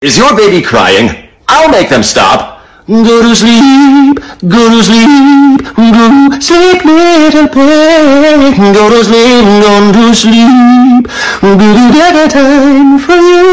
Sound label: is your baby crying low quality